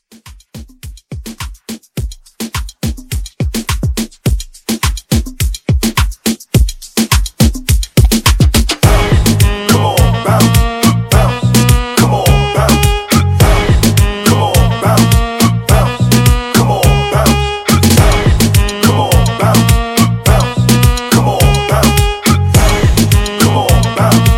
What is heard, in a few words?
Re Drum 105; Moomba